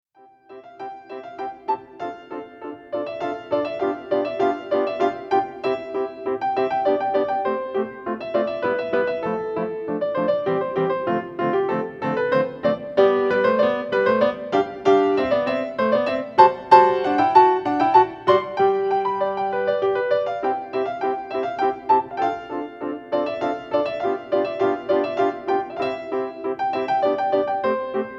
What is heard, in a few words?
Prelude in Classic Style in C, pianist